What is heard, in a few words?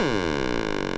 thrust